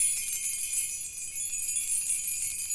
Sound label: Rythm Key Loop